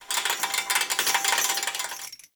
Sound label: Cage Rattle, Hanging Cages; Puzzles